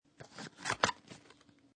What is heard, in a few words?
Paper Box Or Cardboard Box Open; Materials